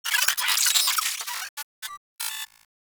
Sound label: space drone attack; Enemies; Warframe; Corpus Space Drone